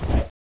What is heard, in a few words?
body kathump